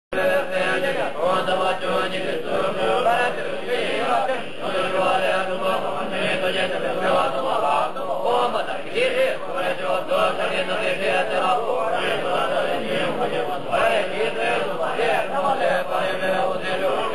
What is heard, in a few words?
Sera Chanting, Tibet